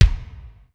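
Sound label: ballad kick, sr16 bd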